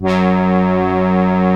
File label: SIEL, String Machines